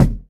mid heavy kickdrum sound e key; kick drum samples

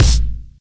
reverb kick single shot a key

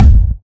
clear kick drum f sharp key; kick drum samples

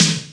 natural snare e key, Loudest frequency: 3580Hz

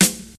treble heavy snare single hit F sharp key

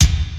airy kick drum single hit E key; kick drum samples

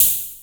original closed hi hat sound A key

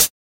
old school original hat one shot f sharp key

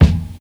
bumpin kickdrum sound f key; kick drum samples

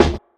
bassy acoustic snare sample d key; snare drum samples

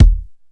steel kick drum g sharp key; kick drum samples

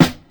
good snare drum sound e key; Duration 0:01

